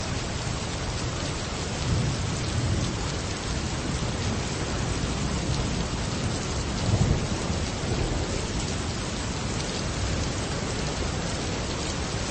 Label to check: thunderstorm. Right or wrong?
Right